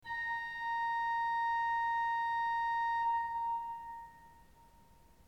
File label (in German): ton, orgel